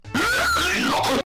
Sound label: Guy Screaming